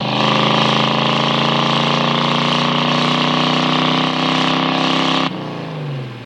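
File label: Mustang Accel Short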